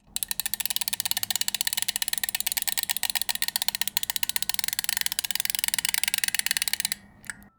Close Rewind Of Metronome And A Music Box

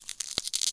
ice; CS Level2

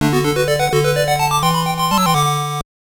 Jingle; magic knight rayearth; game gear

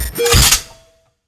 Hard Hitter

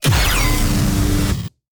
Sparks

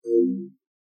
PlayStation 2 Game Start

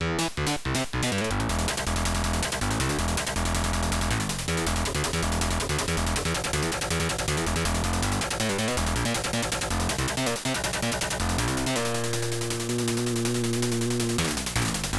Battle Scene (BASS!); Strawberry Daisenryaku Novu; pc-98

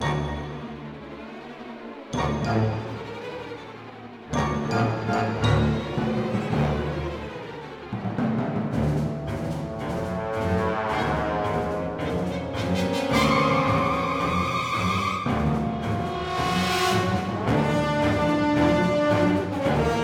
Music Combat Boss Magic Phase

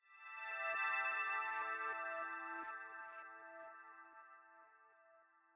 Synth Swell; Legends of Pegasus

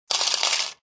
audio gain; coffee shop

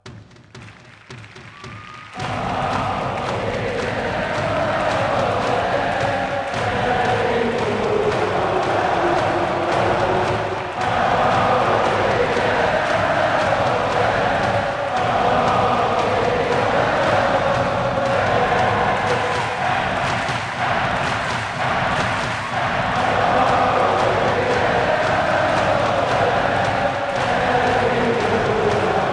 Chants France

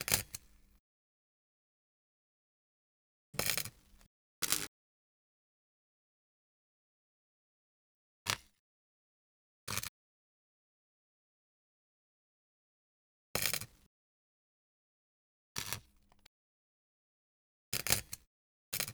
wire

